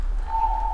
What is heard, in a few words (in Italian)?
urlo; Montagnana